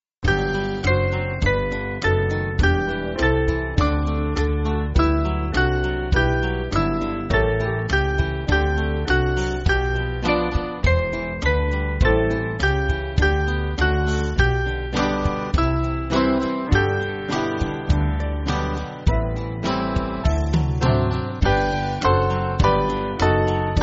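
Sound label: Band, church backing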